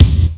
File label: KICK, M1-M3R; M1-M3R KITS; Greytsounds VOL-1